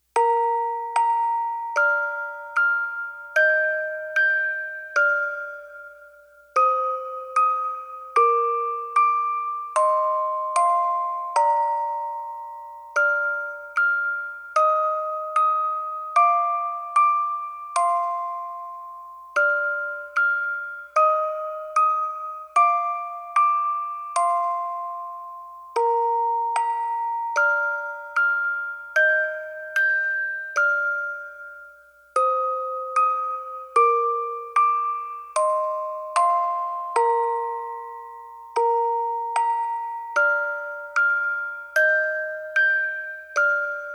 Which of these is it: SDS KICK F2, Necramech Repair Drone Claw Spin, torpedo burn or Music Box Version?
Music Box Version